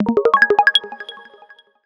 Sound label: Bubble, notifications; Honor; 600 Pro China